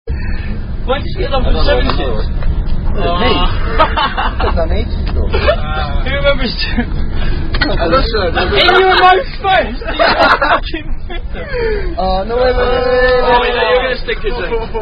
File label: Dirty Pint Drinking Tradition With Zulu Warrior Song; Very Dirty Pint